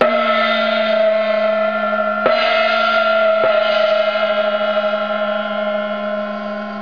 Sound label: gong